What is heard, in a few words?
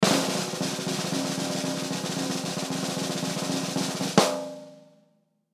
Drum Snare Roll, Snare Roll Hit At End; Drum Kit Sound Effects